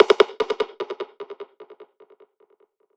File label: percussion one shot; gunner; Slate; SD PASADENA Pop Sample Pack